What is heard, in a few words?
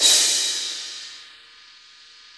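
cymbal crash; Best Service Gigapack 2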